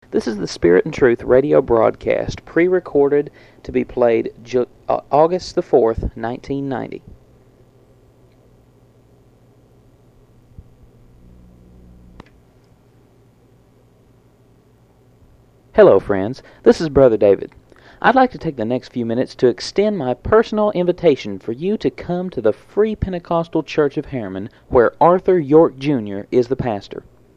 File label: Singing; PRERECORDED